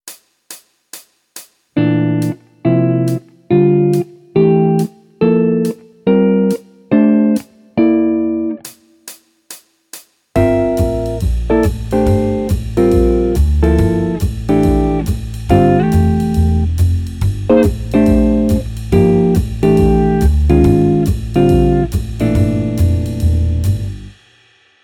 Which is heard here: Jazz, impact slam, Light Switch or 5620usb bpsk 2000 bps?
Jazz